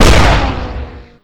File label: Gun Sniper Rifle Shot; Weapons